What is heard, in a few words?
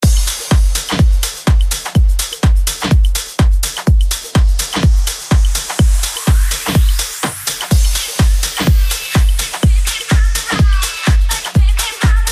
disco dance redrum 125 bpm; mr jay